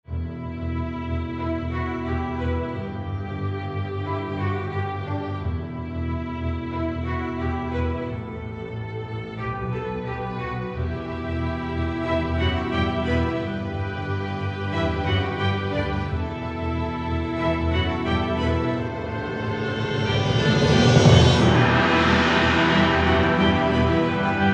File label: the energized march